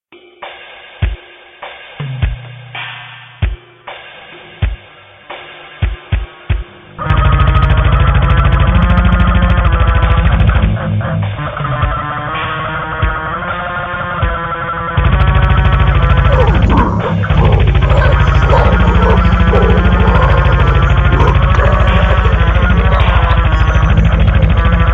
Black Metal

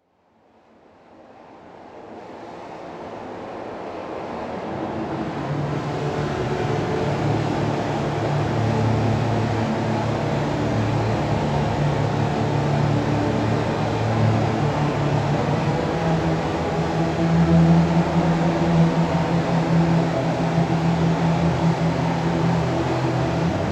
River Ridge Soundscape

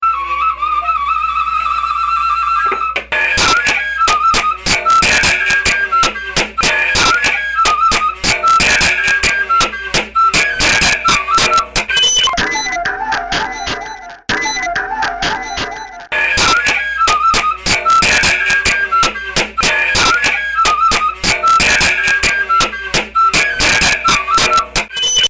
peddlers flute

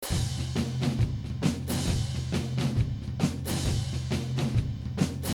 drumloop

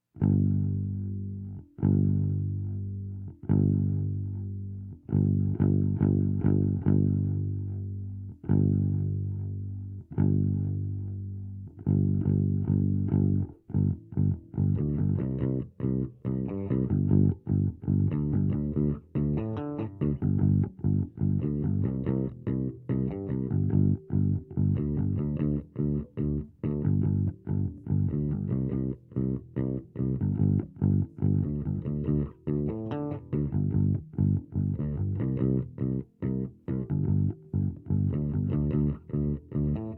bass mono; ardour Session For Remix

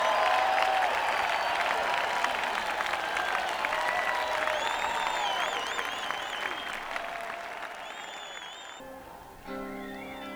Encore Break; neumann km84i

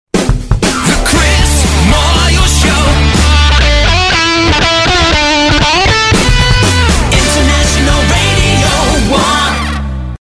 Morning Rock Lang Syne Stab

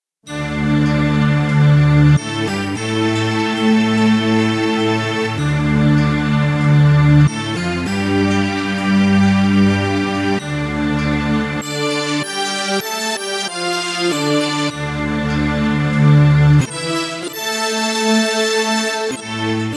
1 note stack, Kawai K1 preset

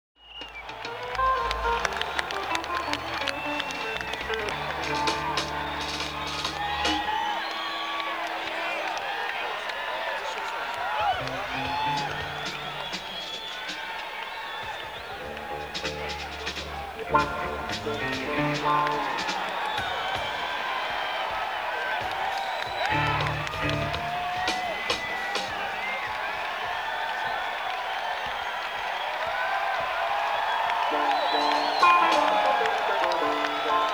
Crowd Tuning; senn421

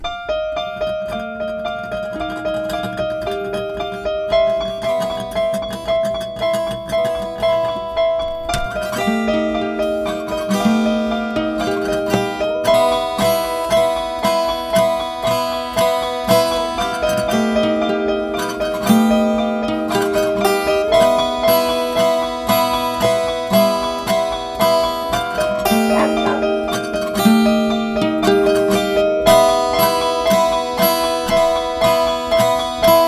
guitars

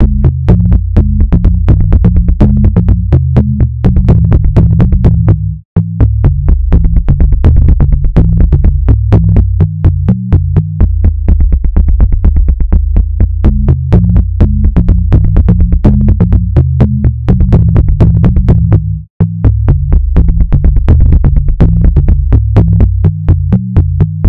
Awesome Beat remix

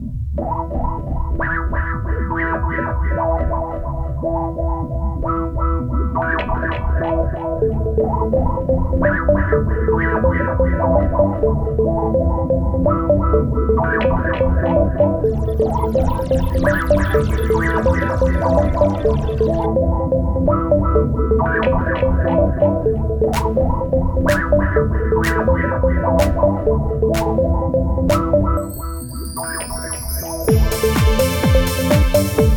Ambient

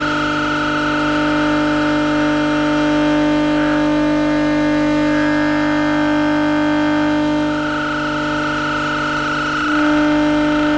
exploration train station nantes electro magnetic

